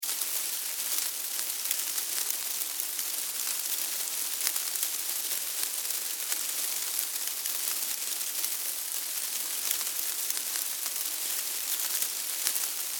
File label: Insect Movement Dig Foliage Leaves Multiple Loop